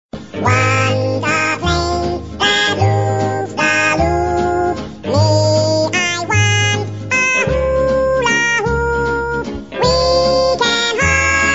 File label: holiday